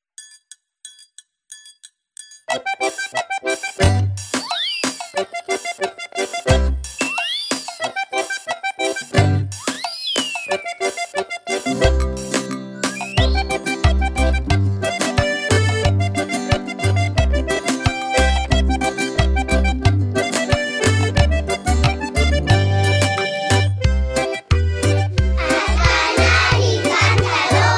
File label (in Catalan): Fem sonar les musiquetes; Animalades